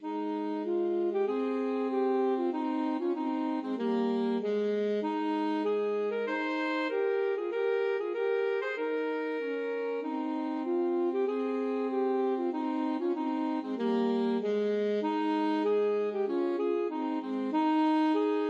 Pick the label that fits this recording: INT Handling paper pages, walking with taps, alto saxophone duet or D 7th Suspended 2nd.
alto saxophone duet